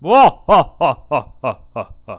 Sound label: evil laugh; halloween